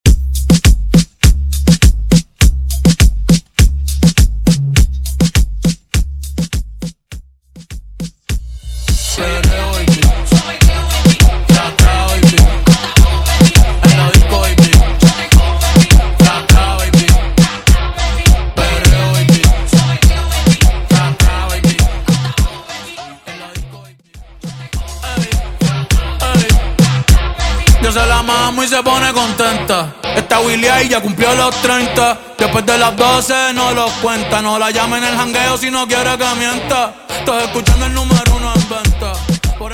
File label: Intro Outro 102 BPM